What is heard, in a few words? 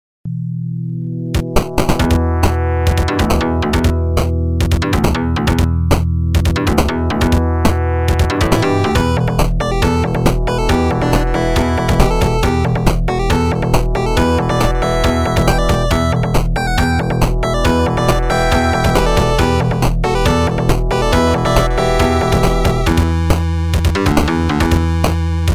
End Music